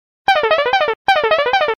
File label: mms incoming